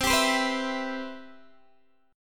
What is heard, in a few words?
C Major 7th, Guitar DADGAD